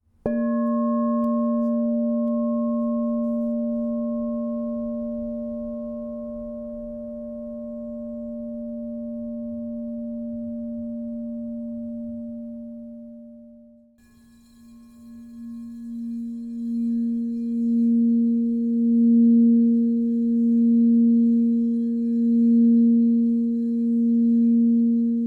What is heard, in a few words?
Crystal Tones Singing Bowls